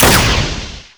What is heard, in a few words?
projectile launch; Tinker